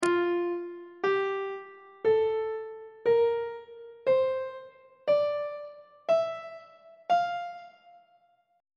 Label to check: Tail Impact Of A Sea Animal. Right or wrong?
Wrong